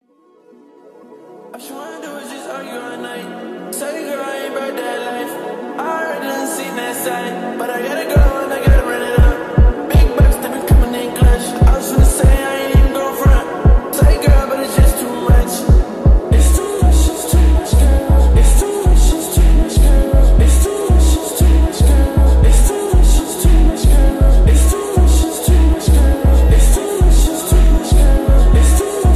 slowed

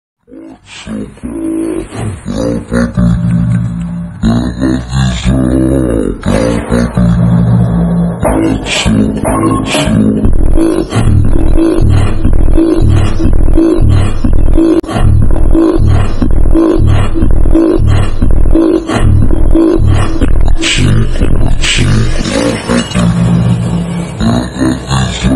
slowed and reverb

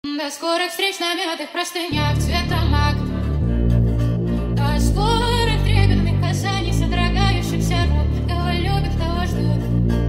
hardstyle remix

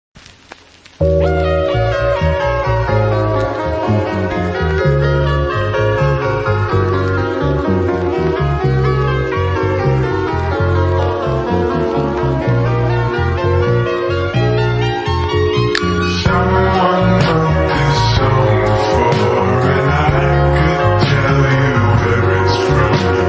intro looped slowed

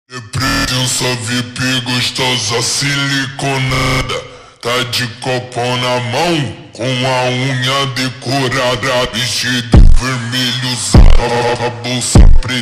automotivo super slowed

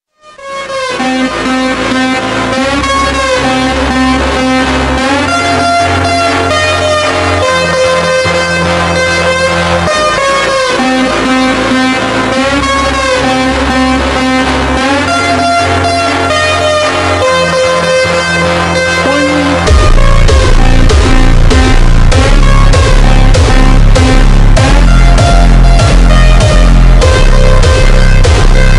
phonk slowed x reverb

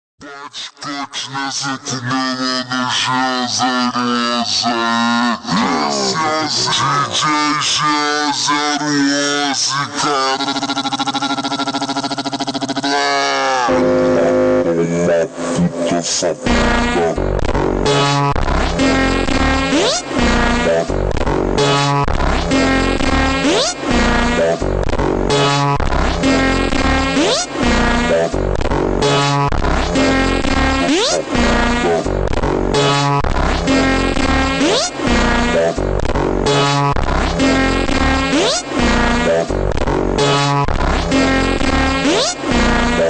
montagem ultra slowed reverb

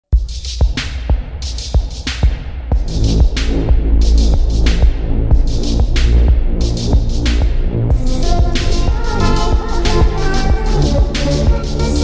slowed reverb echo

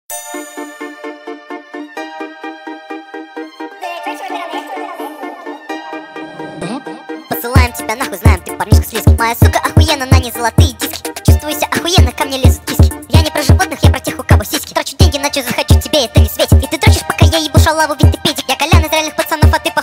sped up speed up reverb

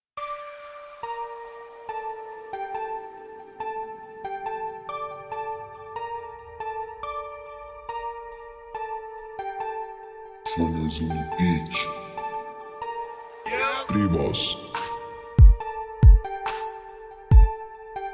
bass test boombox 3 ultra deep bass; JBL; JBL Music

